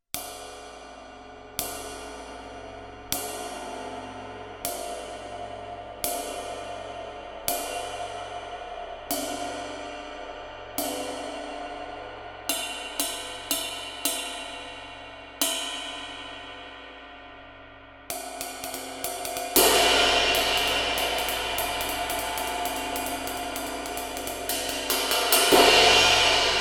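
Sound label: Ride Istanbul Agop Sultan Jazz 22, cymbales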